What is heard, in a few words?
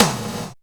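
drum, Dance Synths Percussion; Mad Kit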